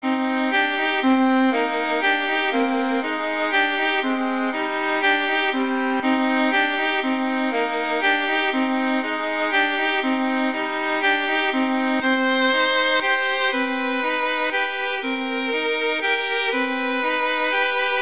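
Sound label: Carol of the Bells, Violin Duets; Christmas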